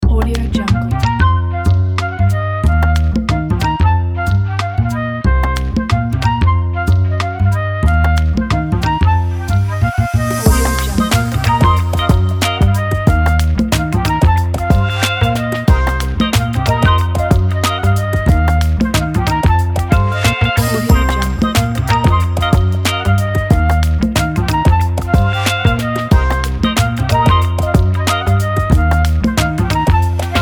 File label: funk music for advertising